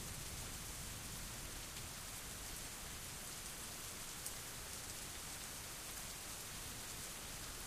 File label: base outside loop; Spookystation